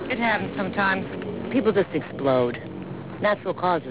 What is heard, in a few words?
people just explode